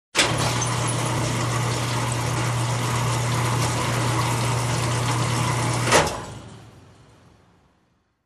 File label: Industrial Sliding Door opening